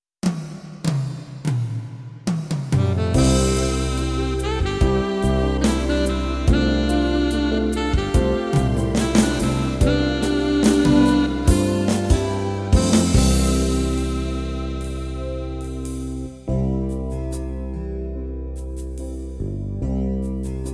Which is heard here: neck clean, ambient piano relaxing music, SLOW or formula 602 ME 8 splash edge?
SLOW